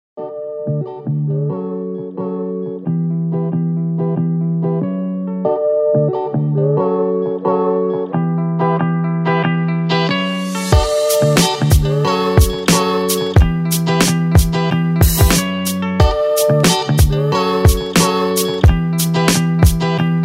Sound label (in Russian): rep muzyka bez ap